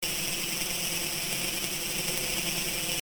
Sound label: Smeared Data No Struct; lattice